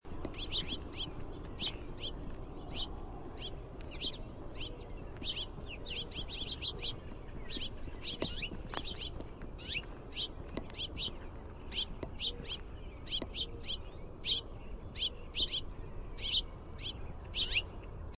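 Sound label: call, House Finch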